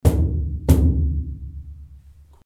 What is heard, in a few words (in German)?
Vorher Cajon; Raumakustik